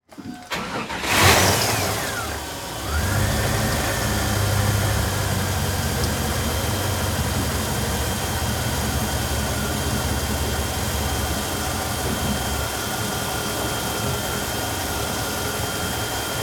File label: Boat Start Idle; Blastwave FX